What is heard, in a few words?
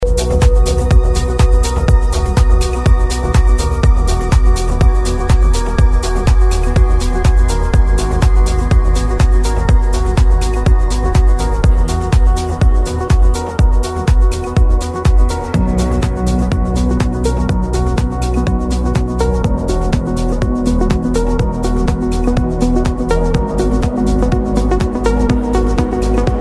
progressive melodic house